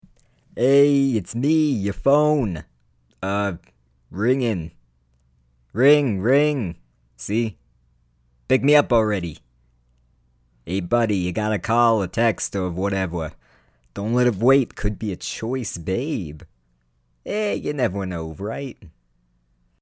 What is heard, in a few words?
ringtone